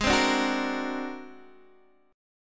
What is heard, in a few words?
Ab 9th Flat 5th, Guitar, Open D; x,11,10,8,9,8